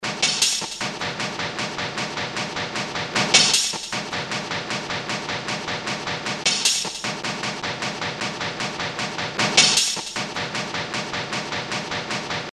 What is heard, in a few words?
Mech Loop, Drum Loops; Industrial